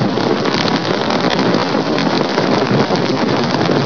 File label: flare burn